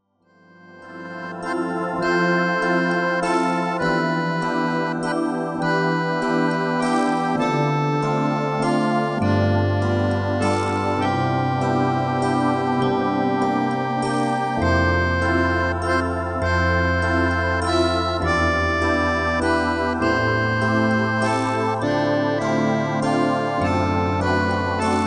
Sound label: Christmas Waltz